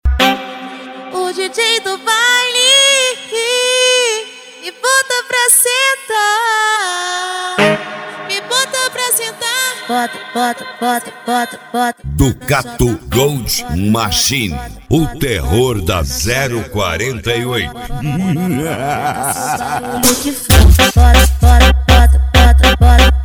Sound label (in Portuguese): especial funk